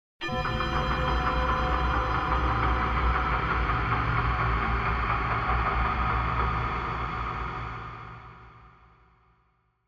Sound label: Bell Ring Eerie Clock Strike Bell Tower; Hollywood Edge; sound designer's toolkit v2